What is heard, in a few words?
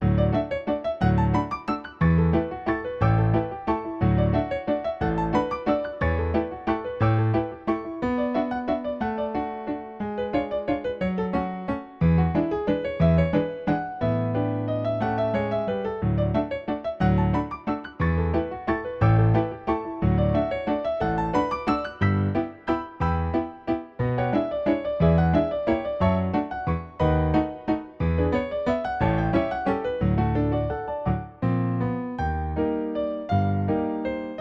Valse Brillante